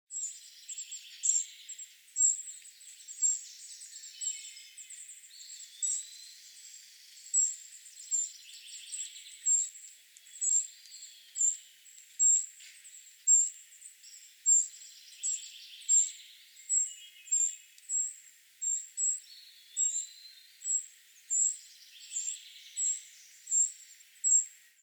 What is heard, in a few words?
Winter Wren Fledgling